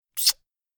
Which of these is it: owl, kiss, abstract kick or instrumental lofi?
kiss